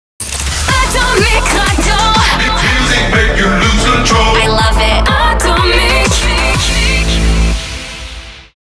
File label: Clock Out 123bpm Rhythmic; ATOMIC; HABILLAGE ATOMIC